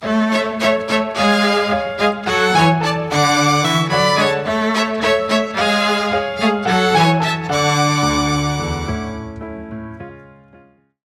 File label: string intro w samples